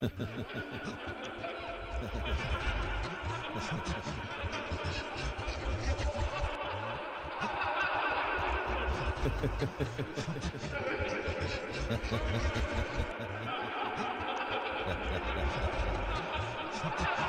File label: ha ha he; The Silicone Town